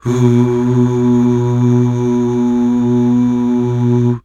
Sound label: UUH